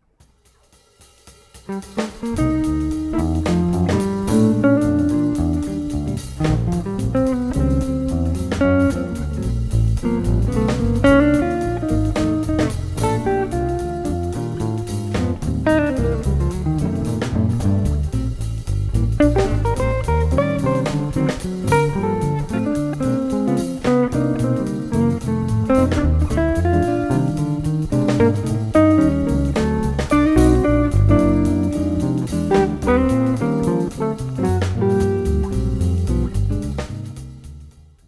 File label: autumn melodia